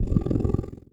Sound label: Dragon Snore